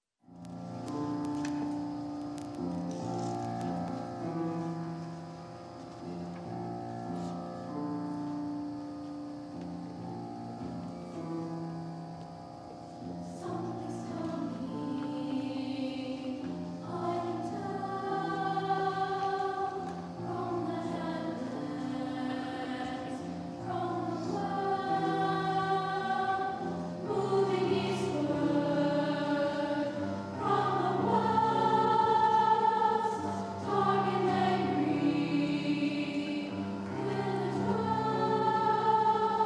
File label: Rainstorm